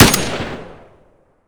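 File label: g36c no silencer; weapons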